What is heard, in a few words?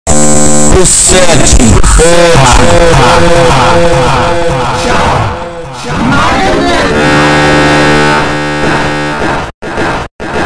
FUNK superbass reverb slow